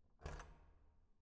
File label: object door remove key